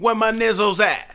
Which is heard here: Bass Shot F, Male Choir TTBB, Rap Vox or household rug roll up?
Rap Vox